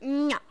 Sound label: wrong